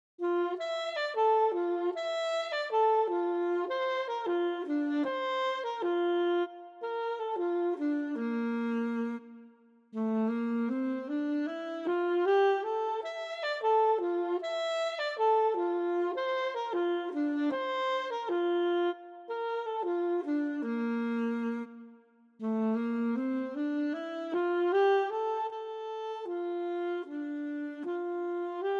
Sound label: Saxophone alto